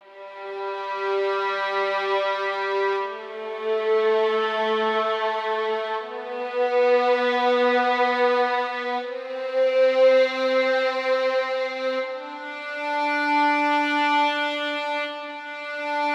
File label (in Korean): G선 연습, 바이올린; violinmethod